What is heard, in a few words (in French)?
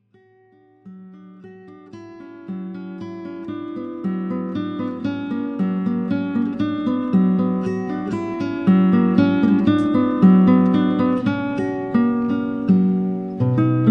Étude en si mineur